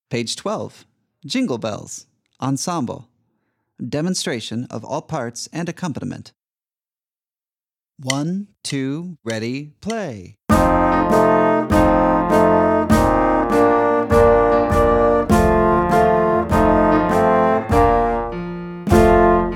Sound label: Jingle Bells ensemble